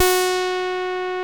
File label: TEISCO, SEQ-FX; Masterbits; Vintage Voltage